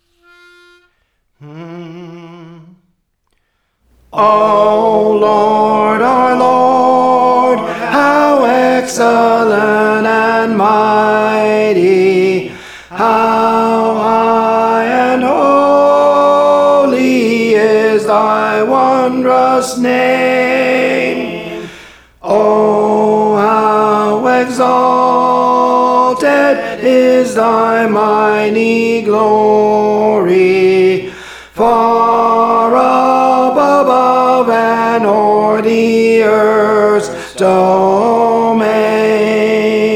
Tenor, Songs; Praise For The Lord Training

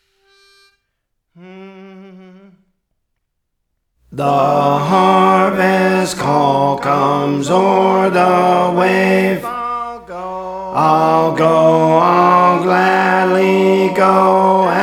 Alto; Praise For The Lord Training

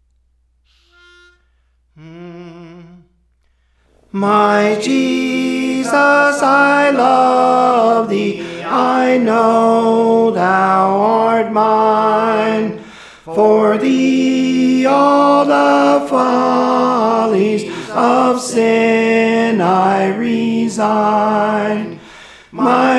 Tenor, Songs; Praise For The Lord Training; My Jesus I Love Thee

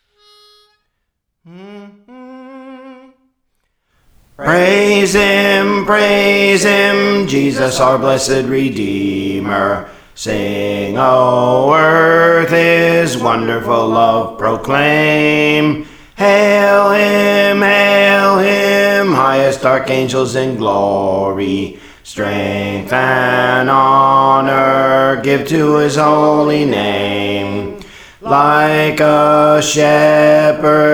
Bass, Praise For The Lord Training; Praise Him Praise Him

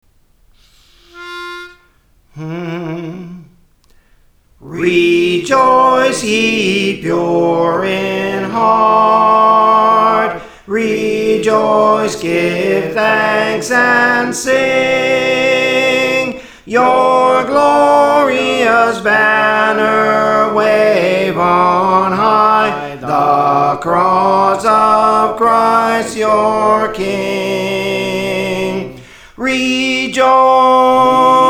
Soprano, Praise For The Lord Training

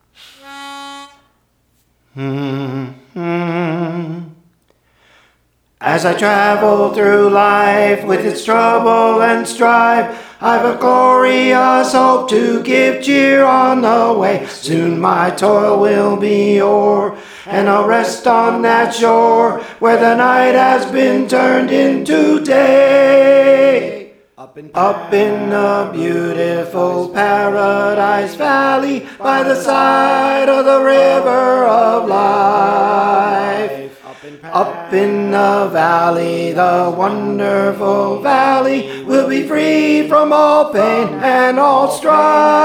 Soprano, Praise For The Lord Training; Paradise Valley

